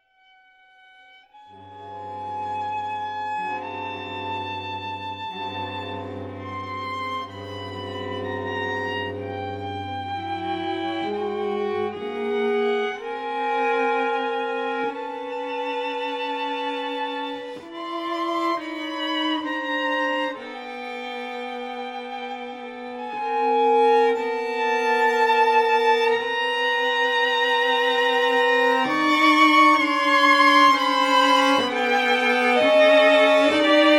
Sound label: String Trio